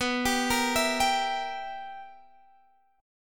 B Major 7th Suspended 4th Sharp 5th B Guitar Standard; arpeggiated; 19,22,20,21,20,x; JGuitar